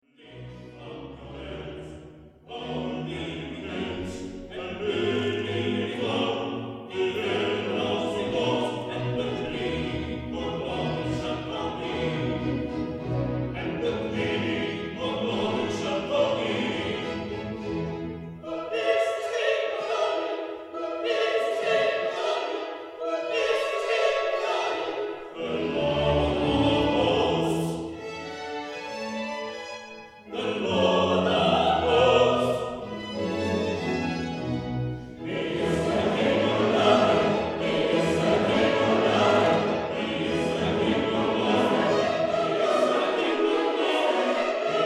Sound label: choir singing